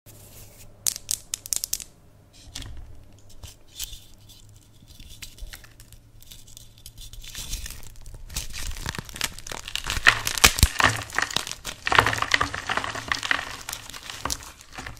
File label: chalk crunch; له کردن صابون گچی; اسمر